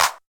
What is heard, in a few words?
clap single shot c sharp key, clap tuned to the C# note. Loudest frequency: 3631Hz; single hits one shots